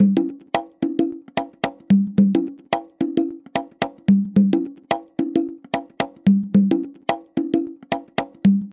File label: latin conga loop, 110